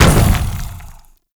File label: Bowl Land C; Warframe; Grendel